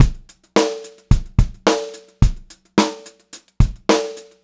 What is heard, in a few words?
POP BEAT, Pop-Rock Drumloops; 108 POP